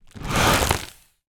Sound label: foley plastic bag blow air into inflate